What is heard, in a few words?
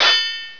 Sword Clang; Castles - Siege and Conquest